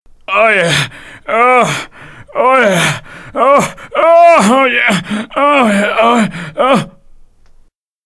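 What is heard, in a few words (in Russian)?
мужской голос удовольствия англ.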